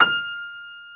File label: pno F5, Piano Grand; Retro Sampler; Piano Grand PF St